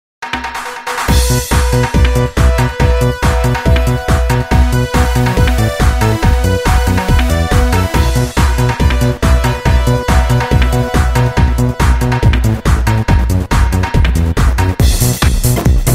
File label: Pop dance